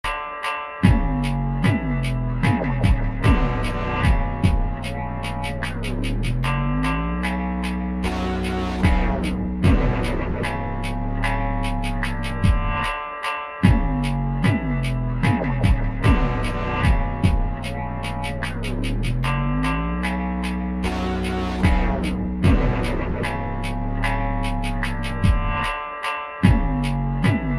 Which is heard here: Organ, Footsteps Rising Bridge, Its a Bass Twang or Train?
Its a Bass Twang